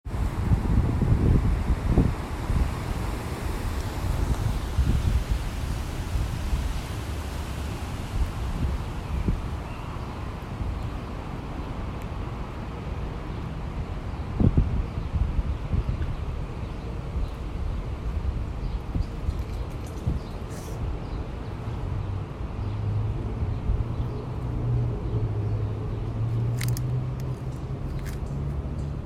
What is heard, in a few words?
Sitting outside on a bench under a tree